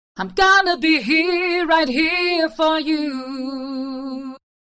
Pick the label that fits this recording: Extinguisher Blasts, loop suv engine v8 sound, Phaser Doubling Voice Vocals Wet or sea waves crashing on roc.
Phaser Doubling Voice Vocals Wet